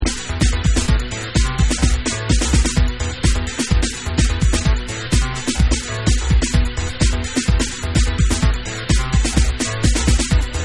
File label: TECHNO